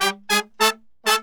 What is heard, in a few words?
BRASS SAMBA